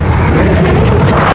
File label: ambient roof top war; hl2